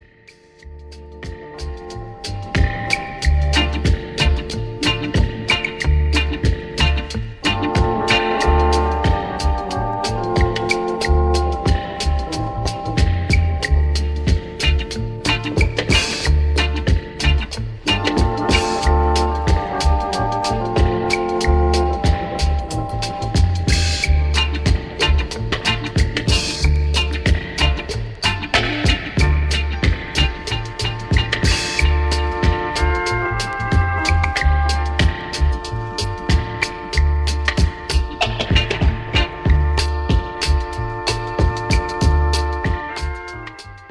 Bad Organ

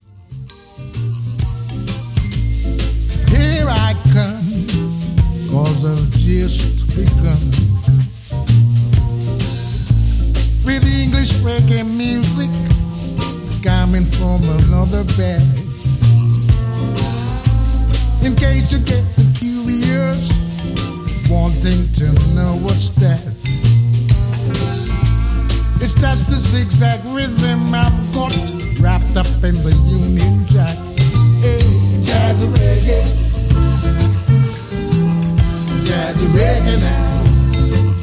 Jazzy Reggae

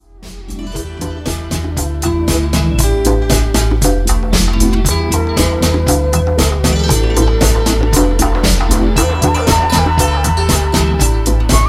Harp Dub

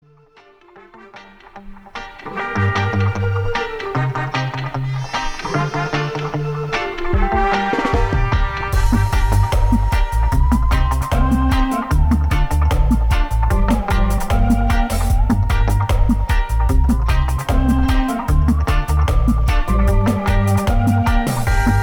Down Here Instrumental

